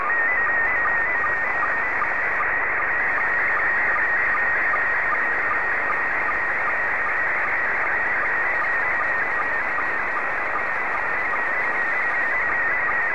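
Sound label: PD50, slow-scan television; VK4MSL SSTV RX @ QG62ln; Transmission Audio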